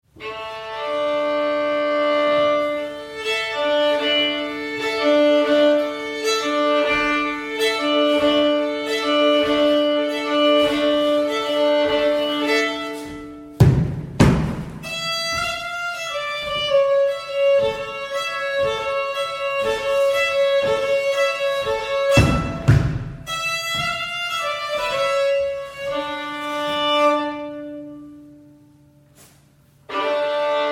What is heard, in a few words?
arpeggio; laiturinaija